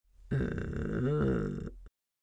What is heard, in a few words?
Mature female tired moan